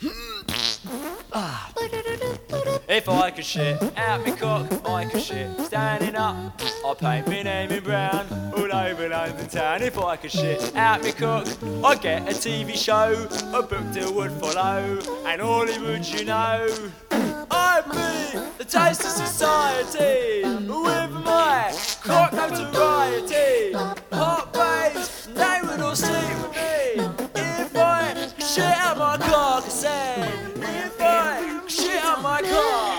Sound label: beatbox